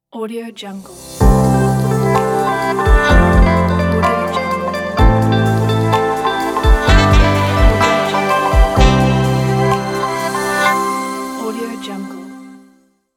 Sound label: a wedding logo; soundbay